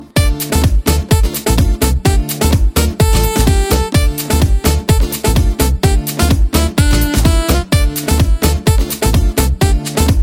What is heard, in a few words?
Sax Mix